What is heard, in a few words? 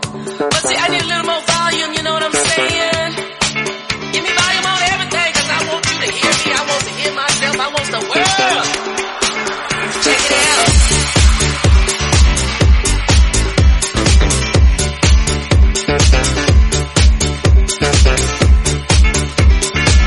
Funky House, 124bpm, D